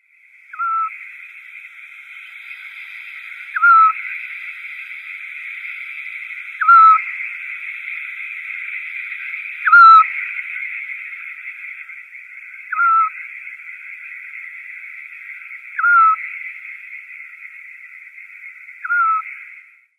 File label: European Scops-Owl call female